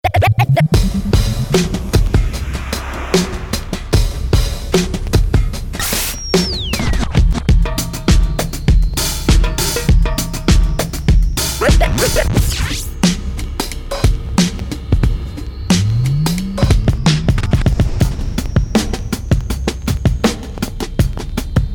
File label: Golden Breaks; Black Octopus Sound; 1K Golden Breaks; Neighborhood Production